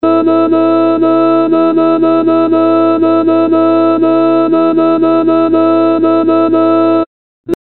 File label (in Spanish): introducción contralto